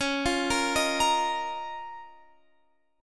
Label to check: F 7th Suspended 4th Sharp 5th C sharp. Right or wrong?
Right